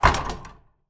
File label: household loft hatch and ladder open down bang